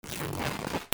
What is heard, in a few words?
RIPPING